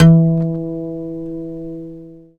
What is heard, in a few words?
GUI A CHAR E, Acoustic, Roland